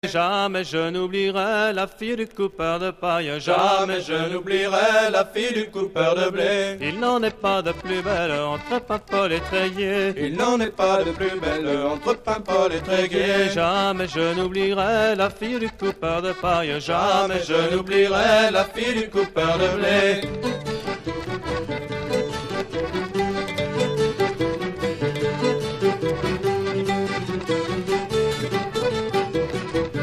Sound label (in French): chants et musique a danser, maraichine